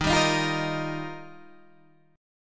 Eb Major Add 9th, down Guitar Drop D

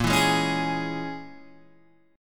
Bb Minor 7th Flat 5th, Guitar, Open G

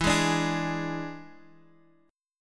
E 7th E down Guitar Standard, E7 Chord; strummed; capo 9

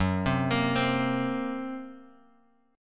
F sharp Major Flat 5th F sharp; F#Mb5 Chord {2 3 X 3 1 X} arpeggiated

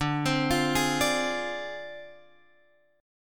D Minor 7th Flat 5th Guitar Open F; Dm7b5; arpeggiated

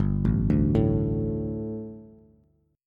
G Suspended 2nd G, down, Bass; Gsus2 arpeggiated; JGuitar

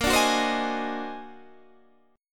Eb Minor Major 13th Bb

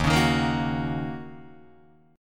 D sharp Minor 7th, Guitar, DADGAD

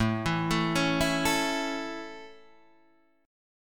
A Major A Guitar Half Step Down, chord

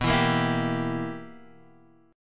B Major 7th Suspended 4th Sharp 5th B down; strummed; JGuitar

